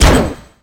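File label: shot from underbarrel grenade launcher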